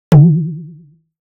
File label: Plastic Tub Impact With Water Hit Pulsating Medium; Hollywood Edge; sound designer's toolkit v2